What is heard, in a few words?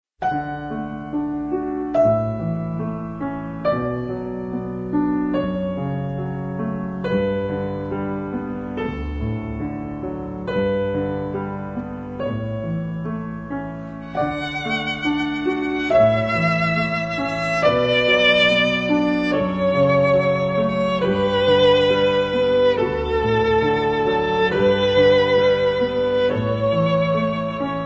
Canon In D Major (piano, violin); Johann Pachelbel